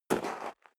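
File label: step dress boots gravel light single right, footsteps; schritte; menschen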